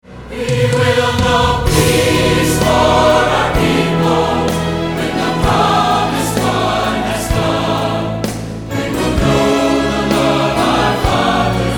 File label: Medley Bells